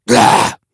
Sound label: slash; cstrike